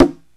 CONGA SET; OMI - Universe of Sounds

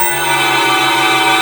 A#4 METAL; Northstar; The Wizard VOL-1; BELLTASY

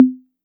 Conga, Roland TR-808; Roland MV8800; TR-808mk2

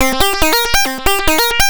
Analoop, Industrial Analog

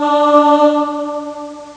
choir; Amiga Soundtracker Sample Packs

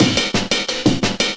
175, Breaks; EZRollers

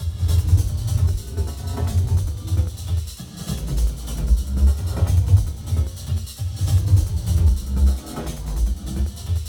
weird bass; Zero G; Malice In Wonderland

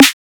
drum snare

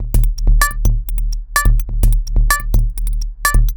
127bpm, House Drumloops

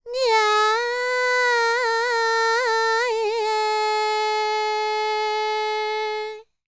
World Voices, INDIA; ILIO; Vocal Planet

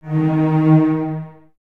Cellos D#3 Detache; Miroslav Vitous; String Ensembles

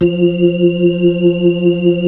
B-3, Brite; Keyboards of The 60's and 70's

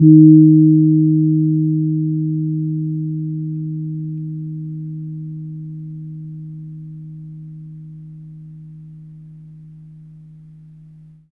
KEMPUR, KEMPUR GONG; Spectrasonics; Heart of Asia

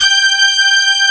MIXBRS G5; AKAI S-Series CD-ROM Sound Library VOL-2

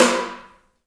HAIRCUTS, KENYA DRM, Heart of Africa

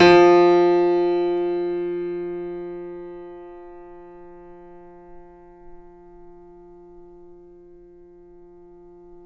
FF F 6, Grand Piano off mic; AKAI S6000 CD-ROM - Volume 2

